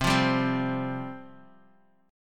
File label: C Suspended 4th C, Guitar, Open D